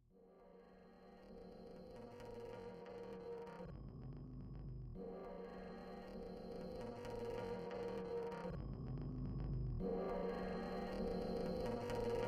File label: Static Song